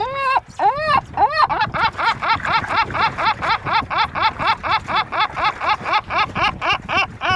gabbiano urlatore

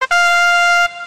trombetta; ordissimo

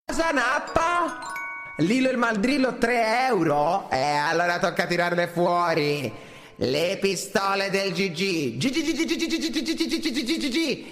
pistole gg in autotune

